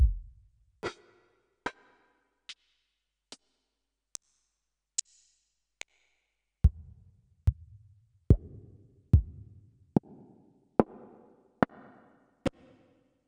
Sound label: Yamaha Tenori-On Bumpkit